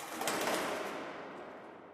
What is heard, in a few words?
amb wing flap, birds; video games; ambient; nature; source engine; portal2